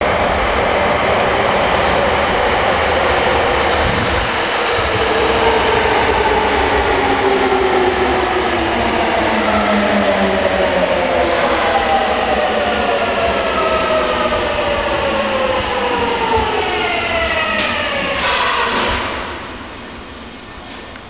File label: train